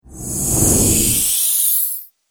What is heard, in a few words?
Future World Whoosh; Whoosh Sound Effects